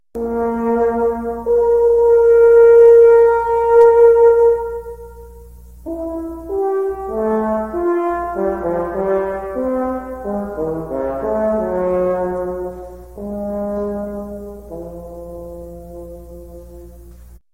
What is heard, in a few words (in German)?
bariton